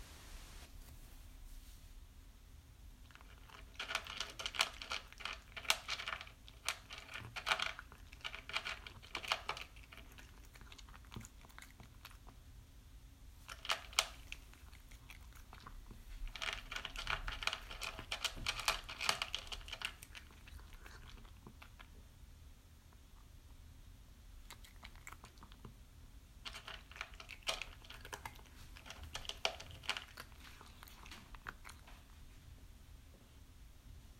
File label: Cat eating dry food